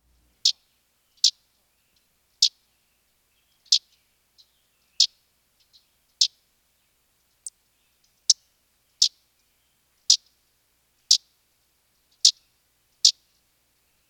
Common Yellowthroat Eastern Calls

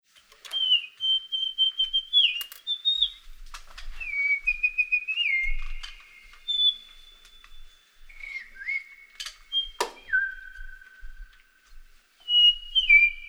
dawn chorus

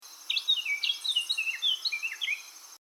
Carolina Wren plastic song; Paul Driver

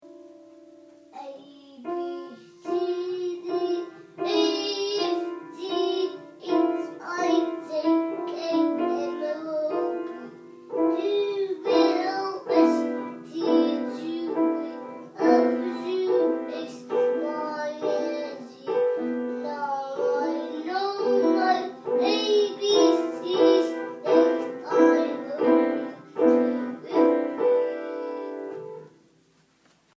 Human 2 yr old plastic song

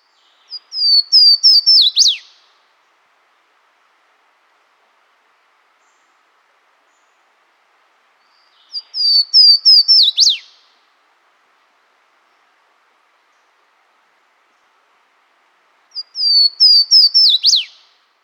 Swainsons Warbler Song

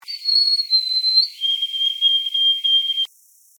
White throated sparrow

